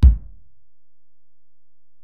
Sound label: Ac BD, Alesis DM-PRO; 300 Drum Machines